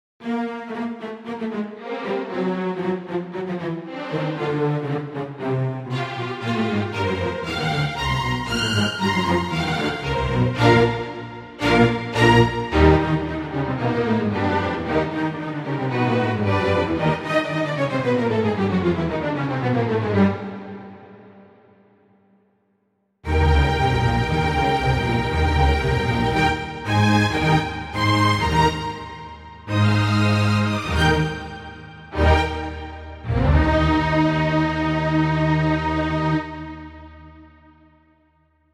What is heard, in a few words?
strings; Liszt